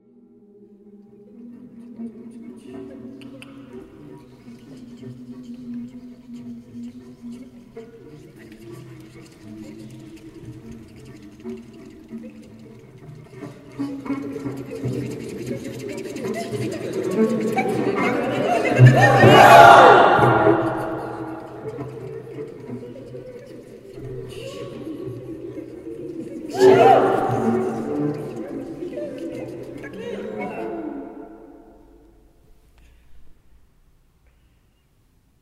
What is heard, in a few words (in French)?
Improvisation soundpainting; stage chants du monde